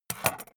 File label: scissors drop